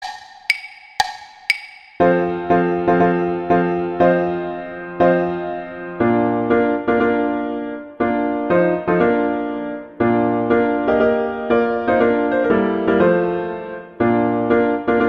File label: Wedding March acc; Wagner